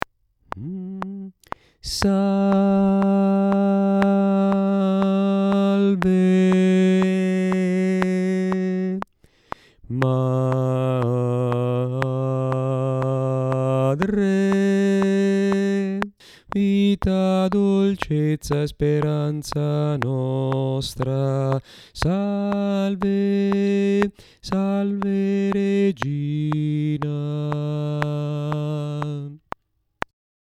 bassi

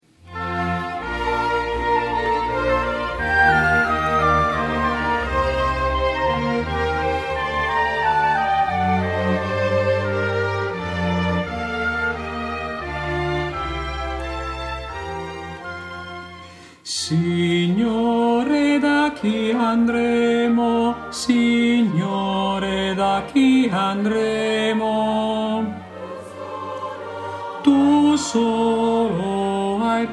signore da chi andremo, tenors

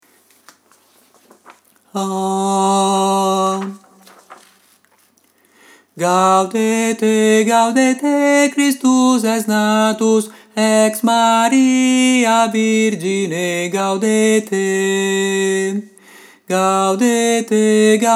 tenori

